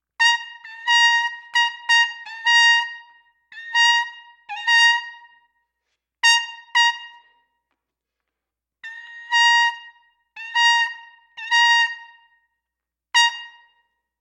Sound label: Half Valve; trumpet